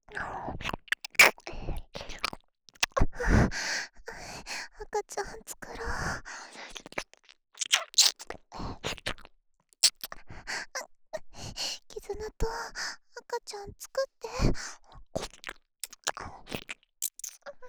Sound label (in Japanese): 耳舐め喘ぎ; DL製作班; ハーレムオナサポ 4人のメイドがご主人様のオナニーをお手伝いするバイノーラル; 赤ちゃん作ろ♪